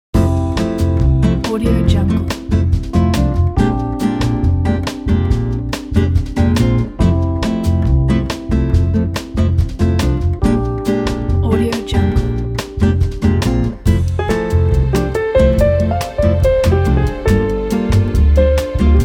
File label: jazz